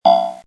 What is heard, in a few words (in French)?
ECHEC; MOBICLIC161